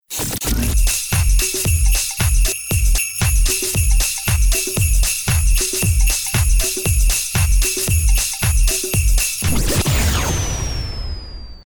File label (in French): Base rythmé